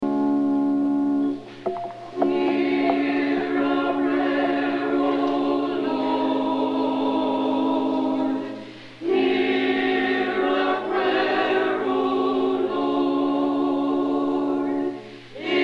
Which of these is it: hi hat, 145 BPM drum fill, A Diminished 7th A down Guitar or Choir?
Choir